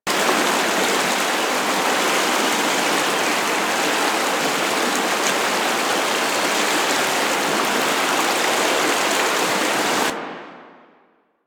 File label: waterfall large loop; Ambience; Props; Loops; Eidolon; Warframe; Eidolon Plains